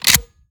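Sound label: reload empty fast chamber, shotgun; reloads; iw8; romeo870; gmod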